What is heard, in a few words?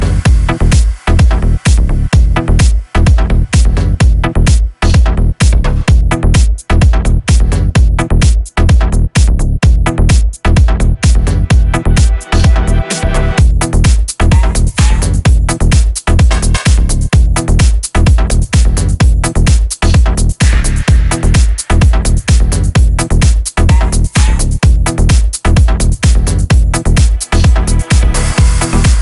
progressive, ringtones, electronic